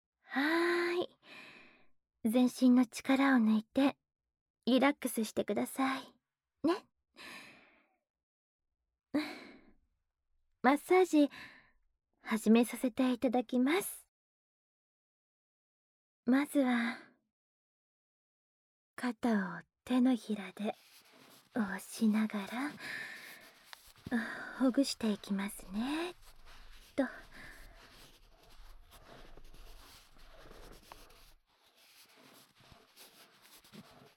マッサージ (ASMR ver)

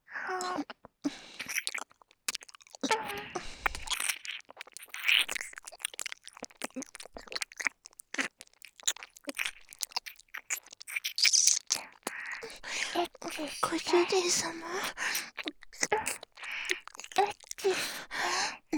耳舐め; エッチしよ; ハーレムオナサポ 4人のメイドがご主人様のオナニーをお手伝いするバイノーラル～文、千曜、名月、莉桜～

